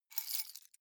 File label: key ring grab stone